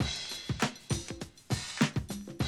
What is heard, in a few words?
drum loop, 100 BPM